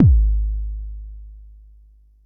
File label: Bassdrum, Jomox Xbase09